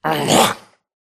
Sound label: grunt death neck; Titanfall 2